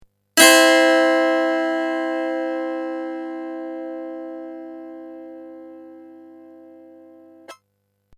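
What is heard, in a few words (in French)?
Tierce Majeure Mesotonique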